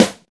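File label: snare drum neutral; SNAREDRUMS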